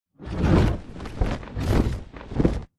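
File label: flyer perched take off medium distance; boneyard; Titanfall 2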